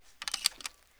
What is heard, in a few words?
rem870 insert, shotgun; weapons; garrysmod